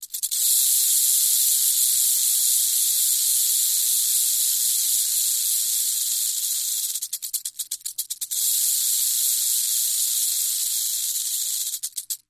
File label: Rattlesnake Western